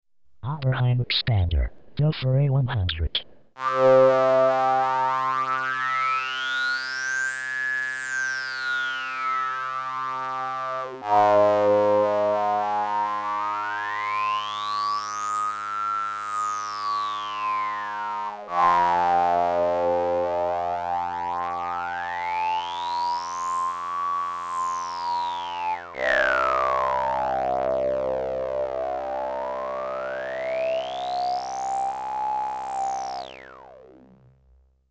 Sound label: 2 Pole Band Pass